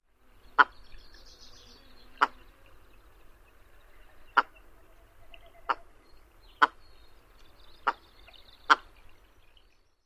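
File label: willow ptarmigan clucks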